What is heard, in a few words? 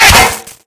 bullet impact bot